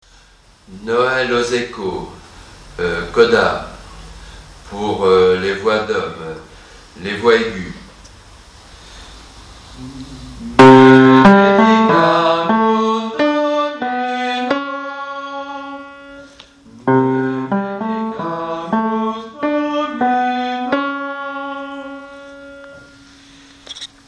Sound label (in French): noël aux échos coda hommes voix aigües